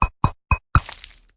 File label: egg; RedHotRoast